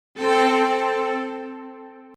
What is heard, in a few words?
grace note